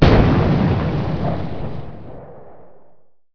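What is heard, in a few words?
explode; machines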